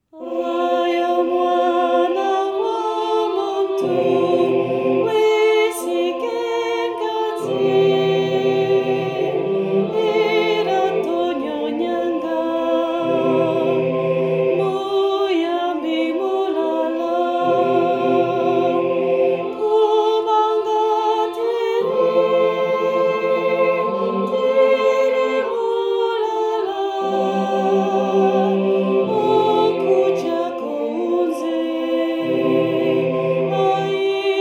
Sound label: soprano; ai omwana womuntu